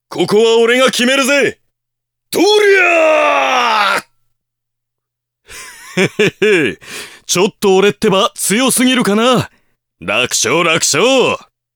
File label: battle